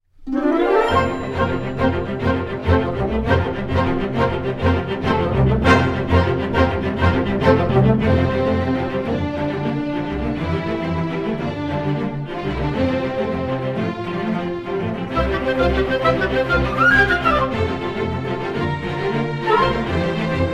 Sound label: Instrumental Karaoke